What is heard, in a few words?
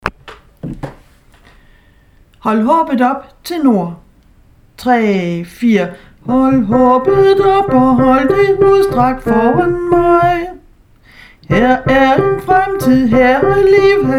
tenor; hold håbet